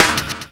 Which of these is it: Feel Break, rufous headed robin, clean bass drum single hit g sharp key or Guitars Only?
Feel Break